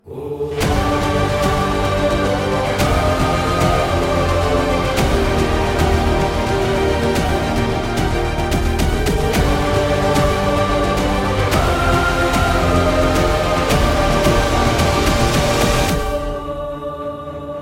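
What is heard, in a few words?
Trailer background music; L2 Empuraan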